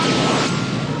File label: boom; Tron3d